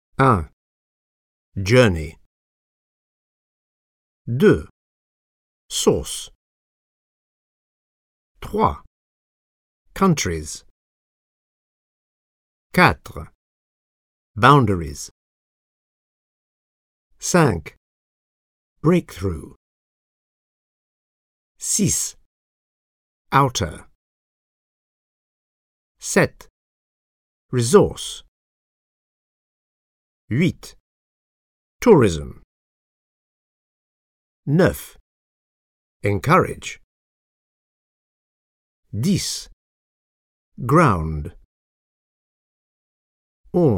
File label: techno